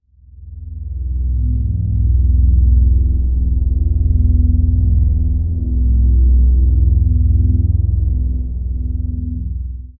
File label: deep ambient room tone, synth